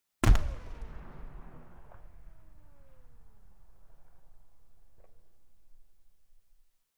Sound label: Warfare, Chieftain, Firing Armour; BBC Sound Effects Library; Explosions Guns Alarms